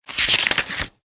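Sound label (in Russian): звук перелистывания страницы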